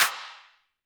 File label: Roland TD-20 Percussion